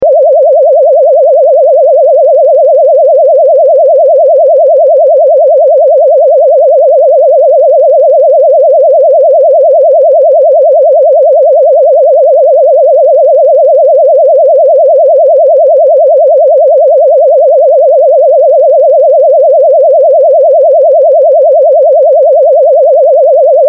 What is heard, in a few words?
Toxic Gas